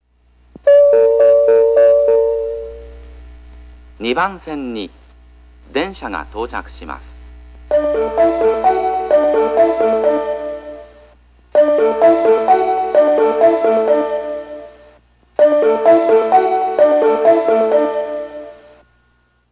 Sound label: yotsubashi train